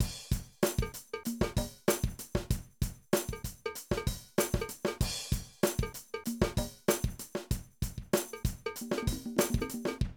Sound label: Drum loops, 70s funk